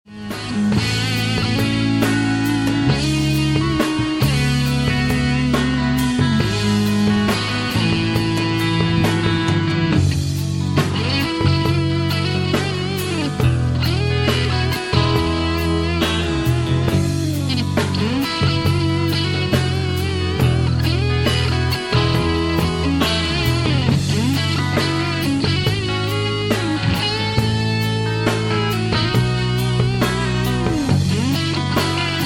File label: rock ballad